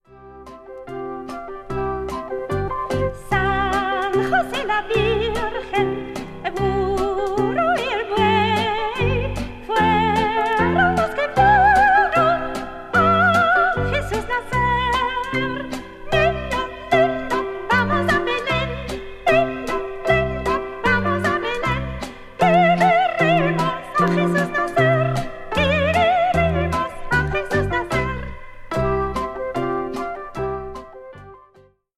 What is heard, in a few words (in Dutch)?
trio viool piano klarinet; katchaturian